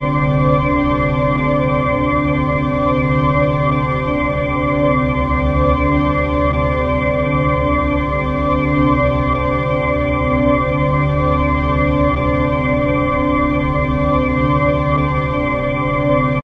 temperature Base Ambience 4 Octaves; greenhouse effect

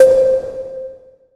Metronome Sound Reverb; keplers laws

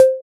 Metronome Sound; keplers laws

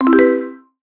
Mass Selection

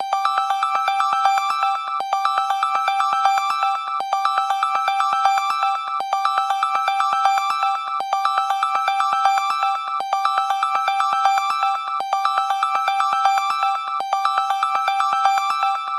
High Repeated Monotone Ringing; quad Track

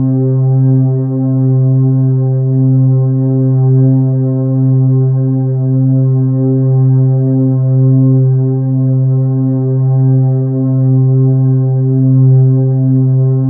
Individual Notes Octave; color vision

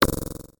launcher Type Spring; projectile data lab

